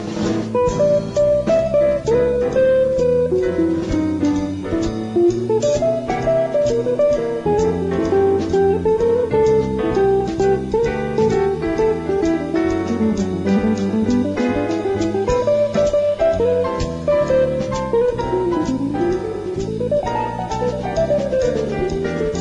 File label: Solo